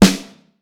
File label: Vintage Snare Drum, 6.5X15; Q Up Arts; Johnny.C's Vintage Snare Drum; 6.5X15 LUD